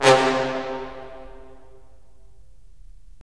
BRS, Roland LA Composer

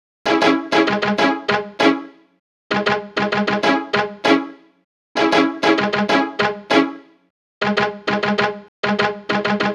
Pizzicato Strings; Sonic Foundry (Sony Creative Software); Club Joints and Street Anthems

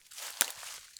Crunchy Step, outdoor, Footsteps

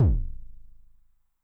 BD MOD [Pitch+Depth+Overdrive], 909mod, BASS DRUM

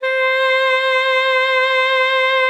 ALTOSAX C5; Xsample VOL-2

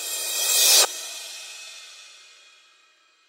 Reverse Cymbal; Drums; House; Best Service; House Definition VOL-1; Cymbal Stuff

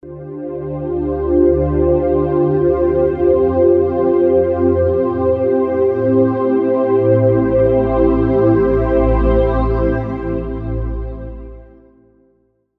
NRG Pad

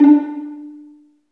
String Vlns Pizz

